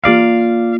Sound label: Notes As7 Up; Hylics